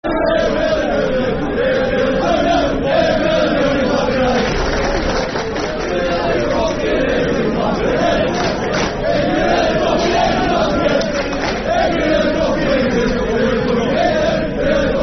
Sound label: Hate Billy Bremner, Football Chant; Soccer Songs; fanchants; Huddersfield Town Htfc